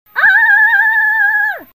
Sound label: ahh